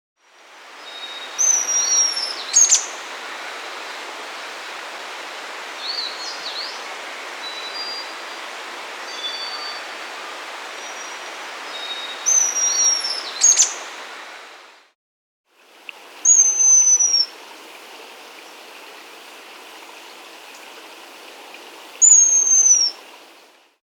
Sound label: Chestnut-Bellied Chat-Tyrant (Ochthoeca Thoracica), Andean Birds; S. America